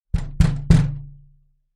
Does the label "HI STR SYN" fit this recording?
No